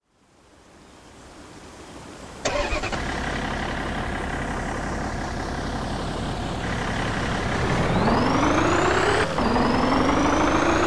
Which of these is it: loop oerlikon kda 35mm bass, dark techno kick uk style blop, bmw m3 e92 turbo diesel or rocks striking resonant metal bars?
bmw m3 e92 turbo diesel